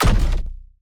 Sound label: block Place; Mindustry